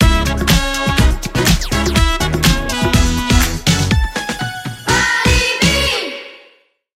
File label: sound logo; Walibi Holland; TAMBR